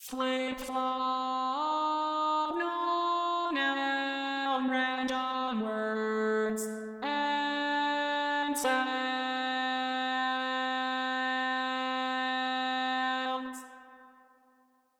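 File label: Baritone, barbershop tags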